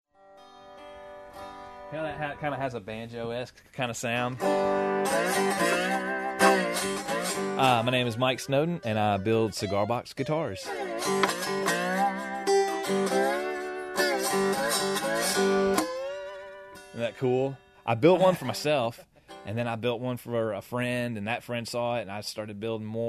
Cigar Box Guitar

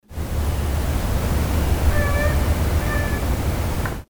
ivory billed woodpecker

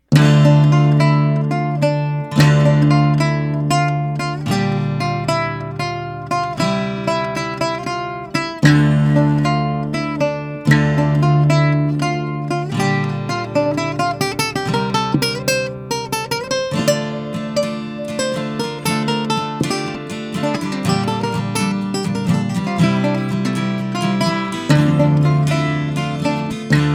lute's lament

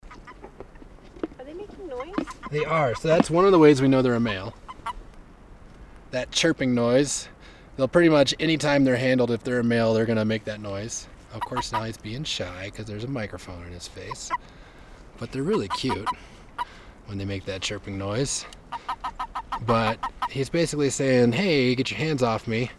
Chirping

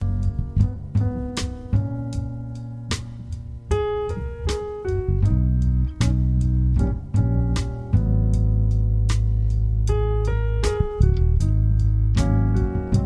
jazz

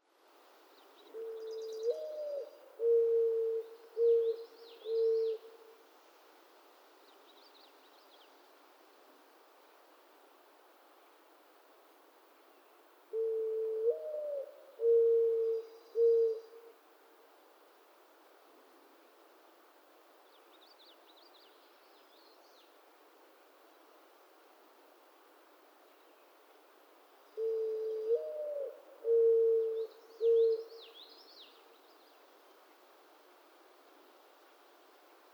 mourning dove

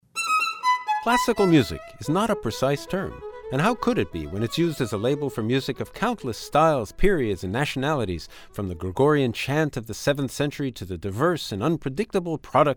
Classical Music

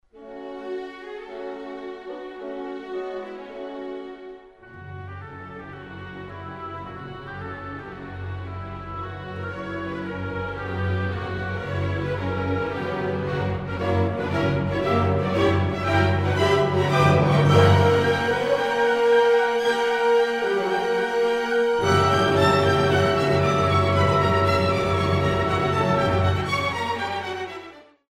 Dvorak Cello Concerto in B minor

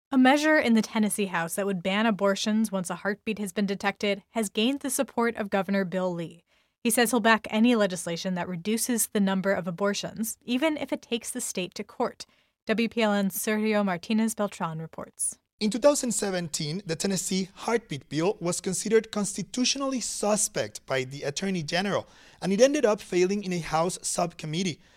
HEARTBEAT

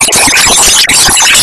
airport noise

